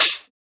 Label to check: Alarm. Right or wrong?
Wrong